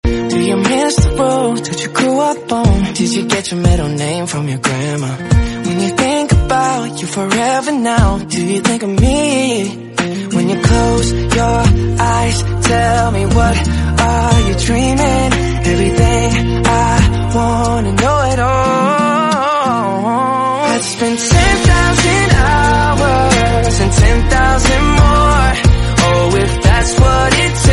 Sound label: New english song Ringtone